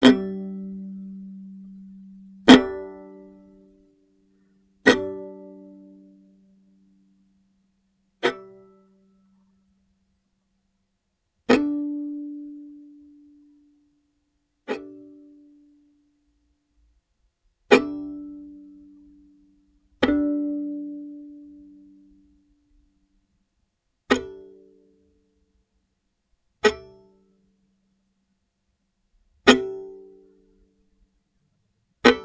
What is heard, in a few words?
Violin Extended Technique